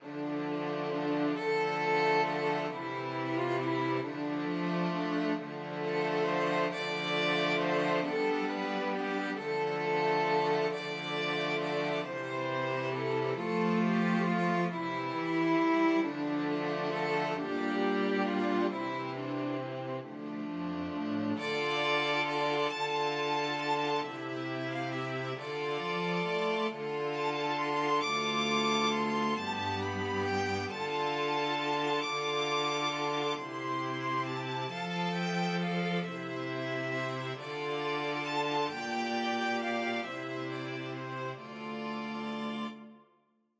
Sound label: string quartet